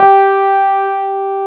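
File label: Wurlitzer G3 - L; USB Soundscan vol.09; AKAI; Keyboards Old School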